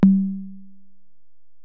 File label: EPerc, Alesis DM-PRO